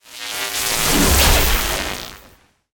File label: Electricity Spells Spell; David Dumais Audio; Magic Sound FX Pack 2